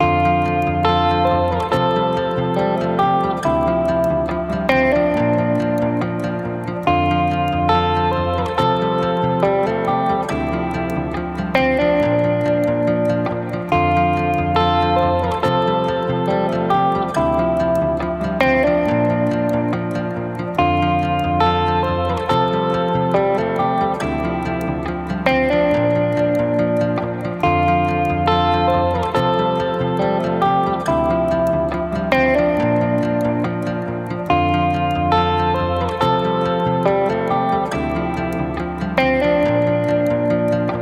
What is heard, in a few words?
music string